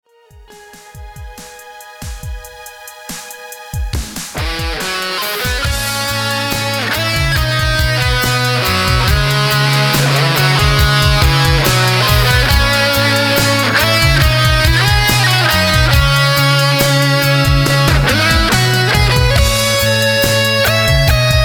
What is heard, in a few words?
Dreamy Night